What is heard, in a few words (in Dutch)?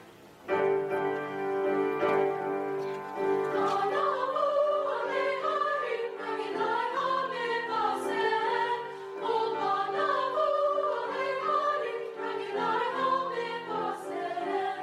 ma navu tenor